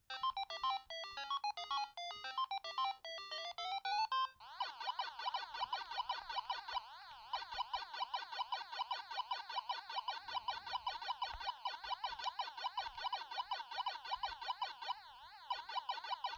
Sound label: pacman